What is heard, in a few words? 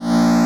turnoff, Retro Sounds 32-Bit